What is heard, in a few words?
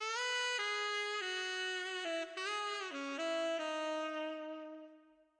tenor sax ballady; HORNS SAX TRUMPET BRASS